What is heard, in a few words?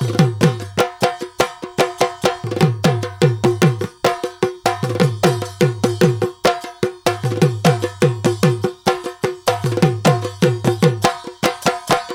Processed Dhool Loop; Gully Loops; waves of bihu vol 1